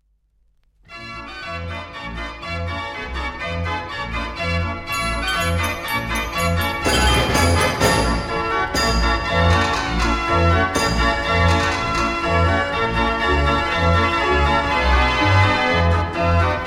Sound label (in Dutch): draaiorgel; Holland souvenir; De Arabier; Philips